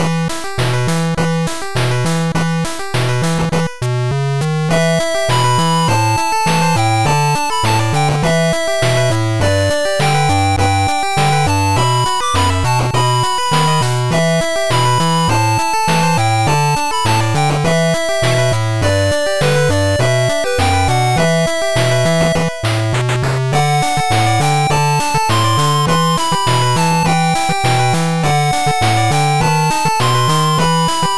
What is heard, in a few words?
8bit